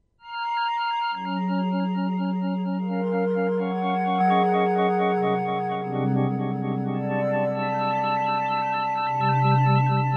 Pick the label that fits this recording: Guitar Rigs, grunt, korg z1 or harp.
korg z1